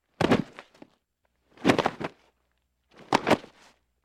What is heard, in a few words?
Impacts Body Fall Impacts X3